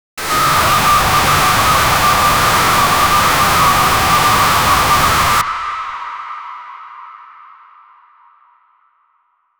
tensed drone

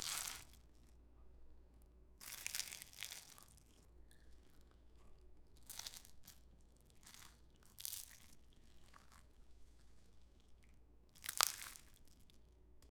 sounds of vegetables bein, agriculture

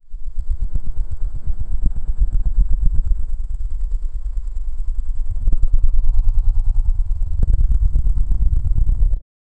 giant python snake catchi; helicopter

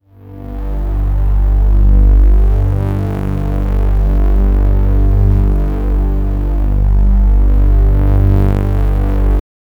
a dark reese bass

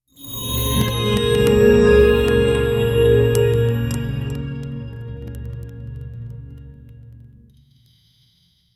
soft wind chime harp bloo; ability

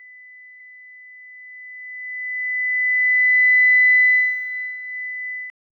the tone is soft high pit, alert

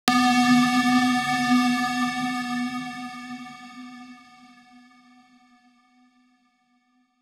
video game item pickup

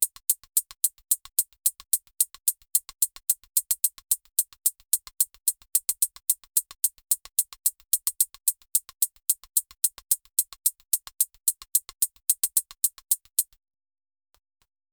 a funky hi hat at 110

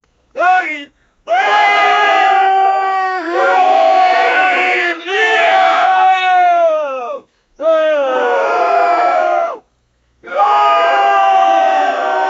a crowd of men yelling; fighting

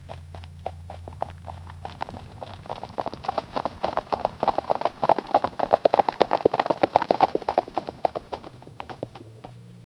horse galloping fast; animal movement

